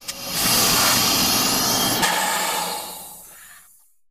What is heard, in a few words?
Hydraulic valves release air and steam. Valves, Release Air, Pressure Hiss, Steam